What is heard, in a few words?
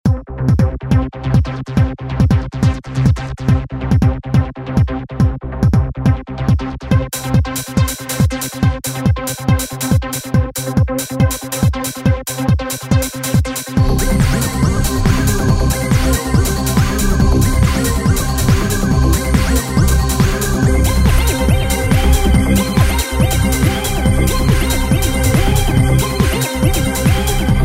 Trance Beat Version